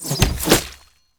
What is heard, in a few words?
scatter hit